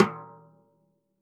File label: stickshot snares off; JazzFunkKit